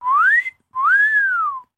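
sounds of cartoon whistle at the sight of a beauty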